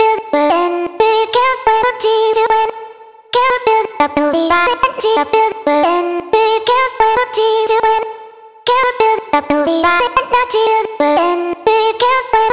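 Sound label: vocal chop, 90 bpm, Am; Darkness; Slate Digital Omnivox Vocal Sample Pack; Chops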